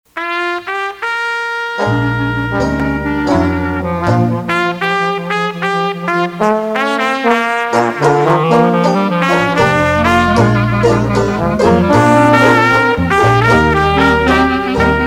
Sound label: Blues, jazz band; 20s band